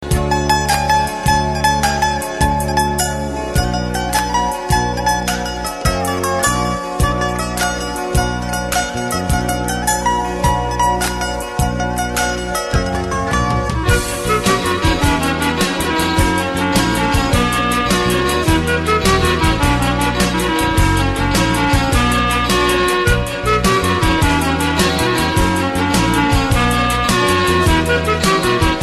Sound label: turkish theme